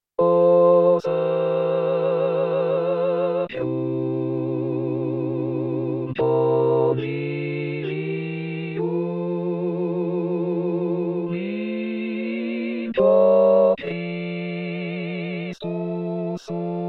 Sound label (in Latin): O Sacrum Convivium coro; anno liturgico